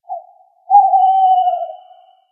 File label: owl call double